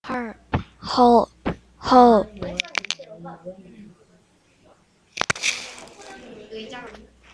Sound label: harp